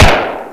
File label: colt 45; WAR